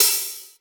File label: wet open high hat sound f key, drum single hits one shots; Duration 0:01; Samplerate 44100 Hz; Channels Mono